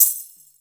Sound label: Tambourine, Room